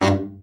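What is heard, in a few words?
baritone, BARITON SAX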